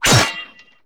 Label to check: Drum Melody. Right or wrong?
Wrong